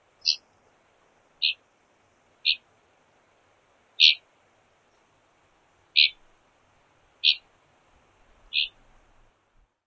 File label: zeet flight calls; TX